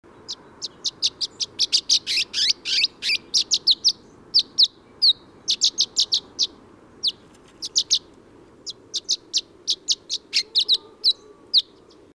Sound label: bird chirping